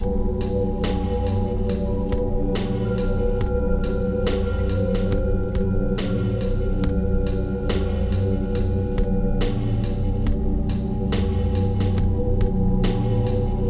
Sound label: Night music